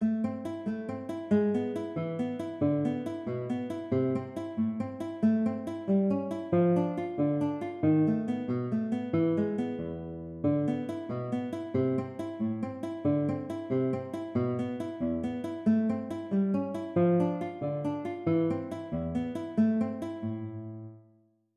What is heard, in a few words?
Moderato, konzertgitarre; Aguado